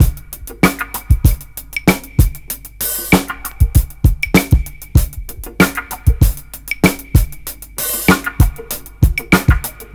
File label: R&B 96.5 bpm; Planet of the Breaks